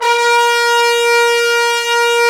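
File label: BRS Trombones, Bone Sec.FX; Roland LCDP06 Brass Sections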